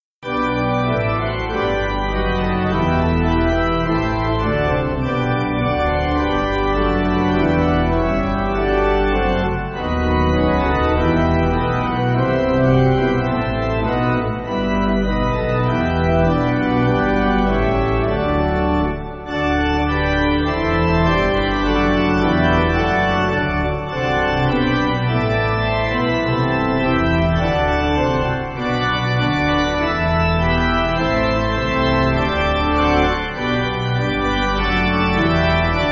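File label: Pipe Organ, church backing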